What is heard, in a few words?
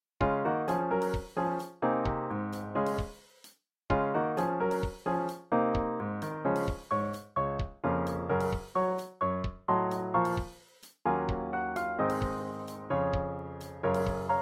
Piano Drum